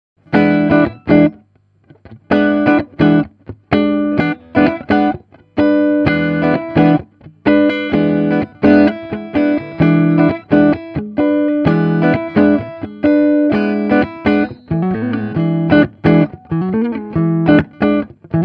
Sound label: little five jazzmaster